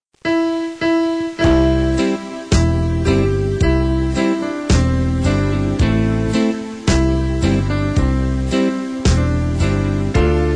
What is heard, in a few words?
BASS